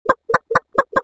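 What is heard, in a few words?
chicken; TamTamMicro.activity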